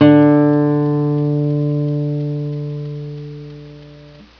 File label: tune 4 d, guitar tuner; Tom Kjeldsen